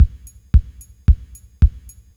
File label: Subtractive Beat; Sonic Foundry (Sony Creative Software)